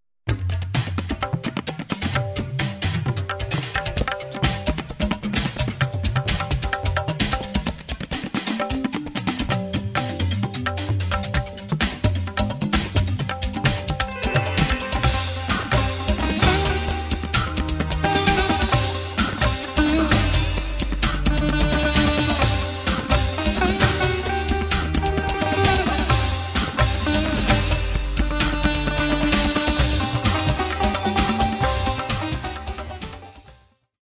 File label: tabla for two; fusion of rock and Indian music